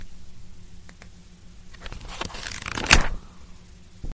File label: book